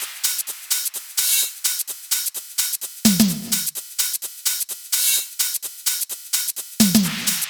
128BPM Kit; Vengeance Samples; Wasa Kit

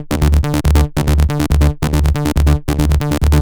Bassline DRY 140BPM, D minor; Vengeance Samples

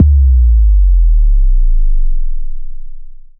Impact; Vengeance essential club sounds-3

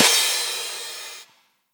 Crash, Cymbals; Vengeance Samples

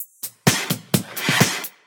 Housebeats, 128BPM; Vengeance Samples; VEH2 Loops

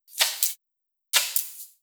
Electro Loop 128BPM; Vengeance Samples

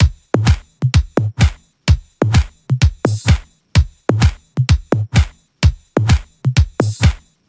128BPM Kit; Vengeance Samples; Fatman Kit

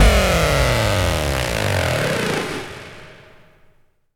FX Sweep Down; vengeance essential club sounds-3

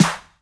Snares; Vengeance Samples; VEH2

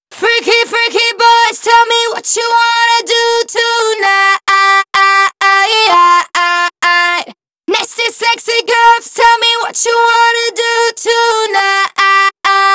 Female Vocals DRY, 128 BPM; Vengeance Samples; Vocal-Hook Kits; Song Kit; Freaky Boys

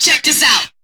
Vocal Phrases; Vengeance Samples; Vocals

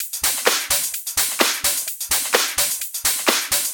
Houseloops 128BPM; Vengeance Samples

